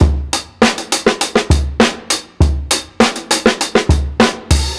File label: 60'S STYLE 100 BEAT, DRUMS LOOPS; BASEMENT DRUMS VOL 2